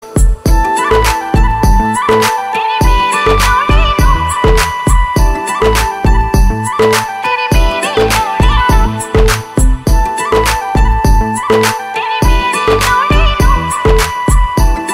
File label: Flute Ringtone